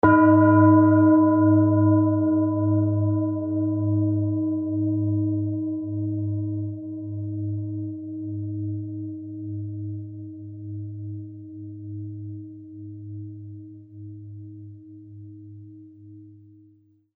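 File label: tibetan bowl uranusset, G sharp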